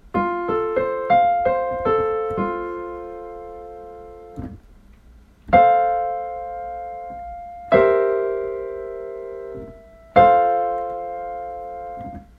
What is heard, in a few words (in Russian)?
аккорды